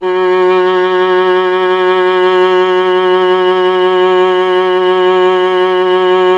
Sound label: viola F; Miroslav Vitous; Solo Instruments VOL-1